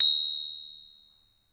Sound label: High Shine Bell